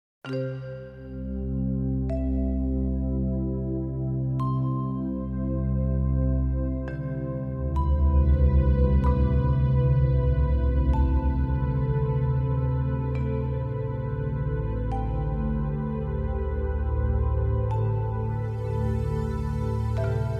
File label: instrumental